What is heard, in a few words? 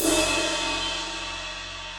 Ride Edge Low Loud Squashed; Drums; Drum Hits; Standard Hits; Ableton; Factory Packs; Session Drums Club